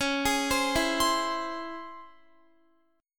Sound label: Db Minor Major 7th Db Guitar; arpeggiated; x,16,18,17,16,19